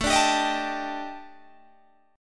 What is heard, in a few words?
Eb Major 7th Flat 5th A, guitar